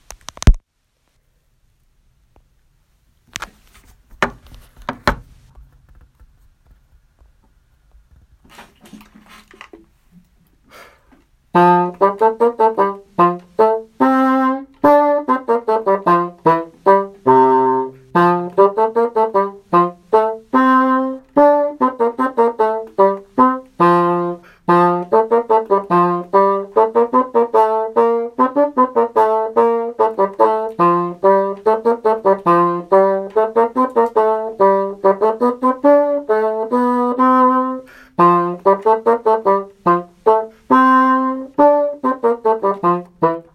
bassoon

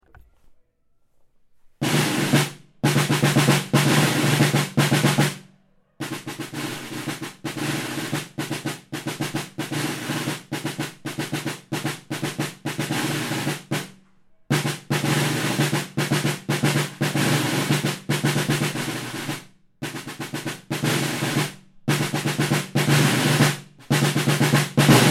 snare drum

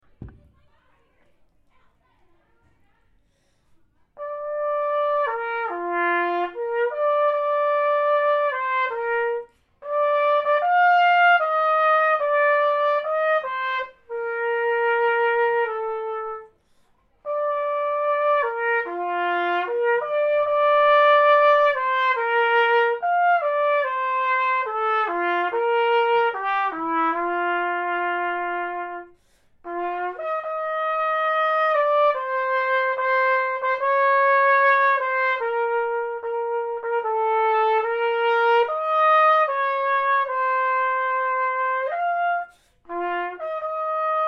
trumpet

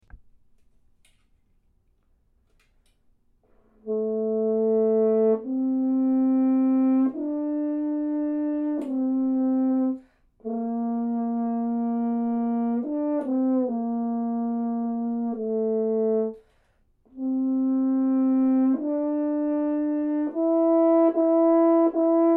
horn

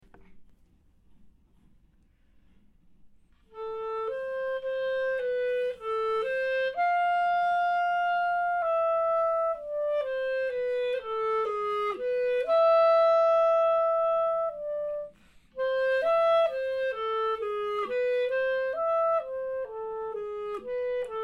clarinet

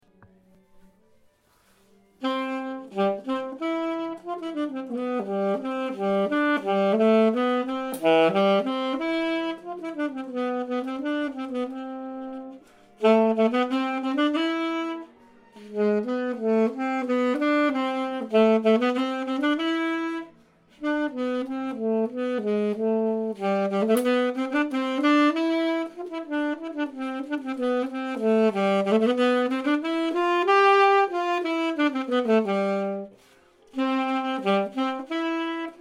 alto sax

